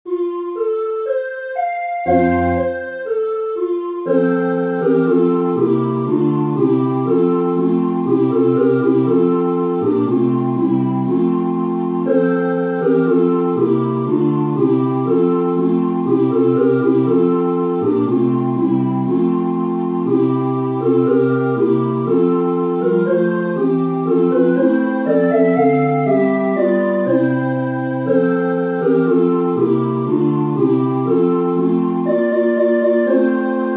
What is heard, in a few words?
midi; deck the hall